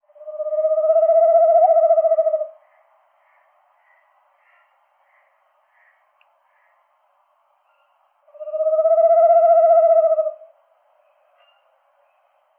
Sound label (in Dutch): bosuil